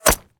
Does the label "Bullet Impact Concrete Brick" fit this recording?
Yes